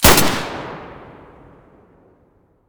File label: m9a3 muzzle mono; beretta